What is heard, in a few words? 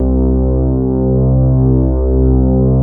C3, BASS; Q Up Arts; Dream Experience